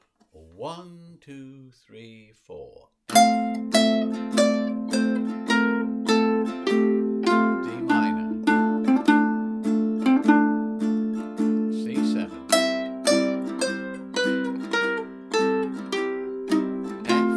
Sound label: Melody